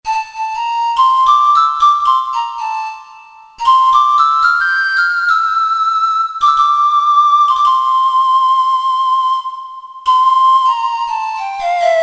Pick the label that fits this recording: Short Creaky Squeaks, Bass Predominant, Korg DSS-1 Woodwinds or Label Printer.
Korg DSS-1 Woodwinds